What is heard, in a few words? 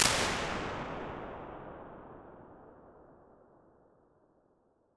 Gothic Church